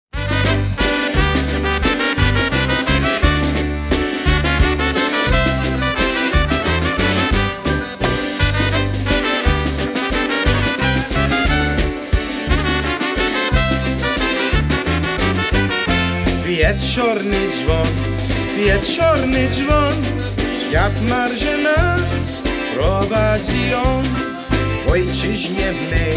Oberek

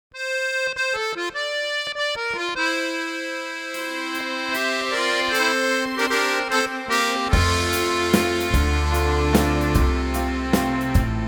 Waltz

